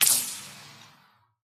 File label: bullet impact ricochet